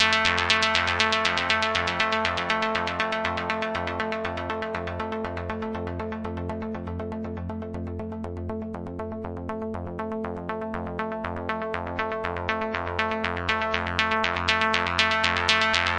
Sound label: octaves filter down n up, synth and electronic loops